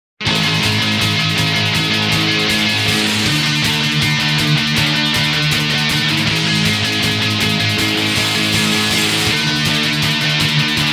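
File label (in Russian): Smeh Pank koncert, laughter punk concert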